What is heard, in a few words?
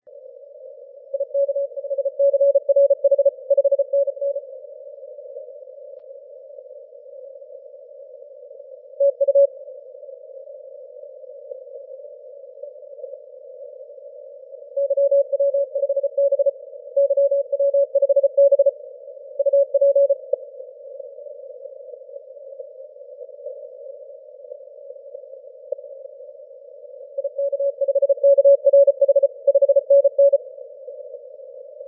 30m cw